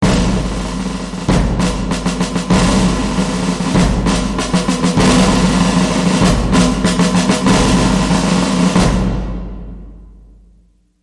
21 Gun Salute

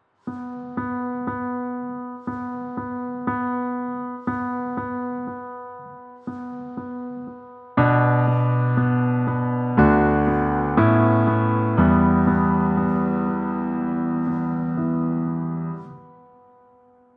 Amazing Life, No Drums

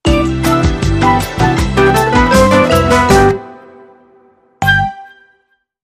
Golden Bossa Retro

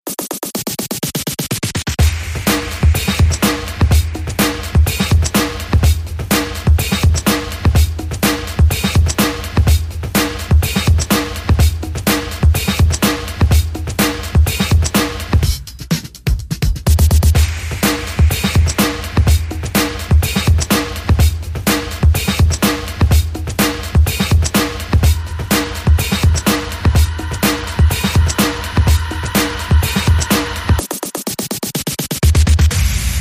Rapid Beat

